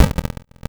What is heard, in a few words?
teleport